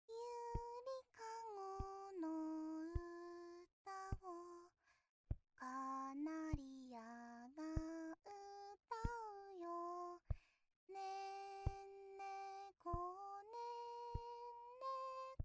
子守歌 ループ前提

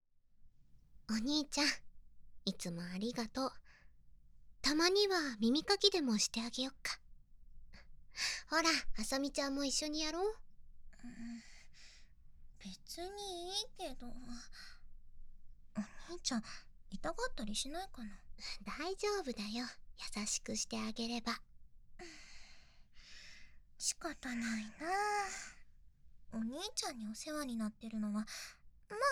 耳かき; お兄ちゃんに耳かきしてあげる♪

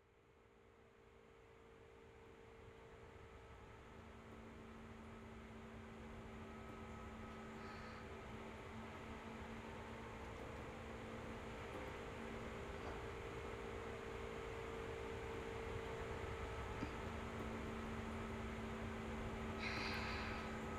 ループ 西武新2000系車内; オクターブガールズ～チップチューン箱守八千代～; 作詞に無限に悩む八千代

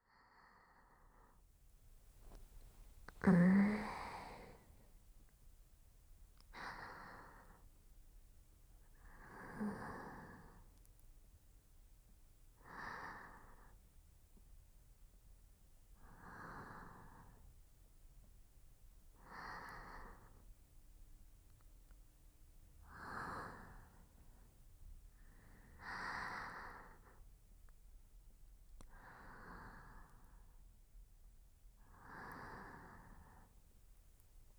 特典トラック 寝息(左側)

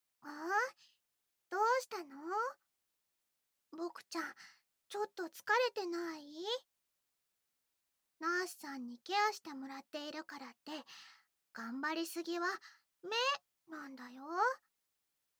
耳かき (左右反転); 菜々子ママ

